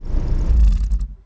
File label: arrow off; Mafie 1